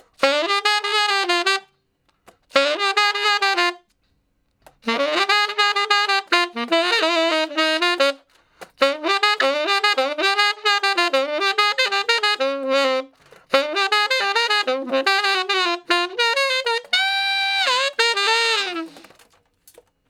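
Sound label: Sax Shuffle (Bb), tenor sax; Crimson Blue and Fabulous Horncraft 4 RnB; Horncraft for R&B; Solos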